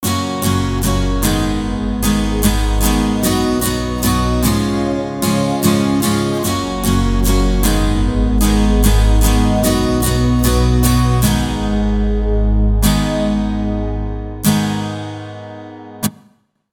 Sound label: Victory (Lose); Aurora Victory (Lose); Speed Racer The Videogame; PS2; Wii